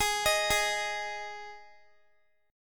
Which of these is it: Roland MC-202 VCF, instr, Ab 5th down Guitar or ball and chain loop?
Ab 5th down Guitar